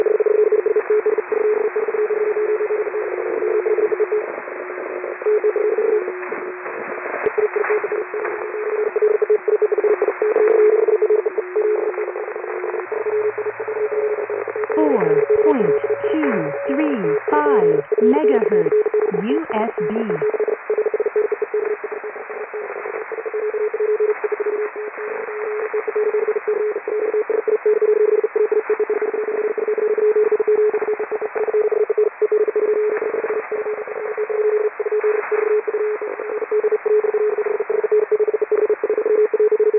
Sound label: usb, audio transmission; 4-235mhz